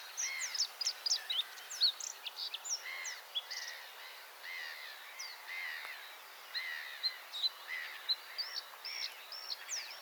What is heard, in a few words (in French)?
Gorgebleue; changis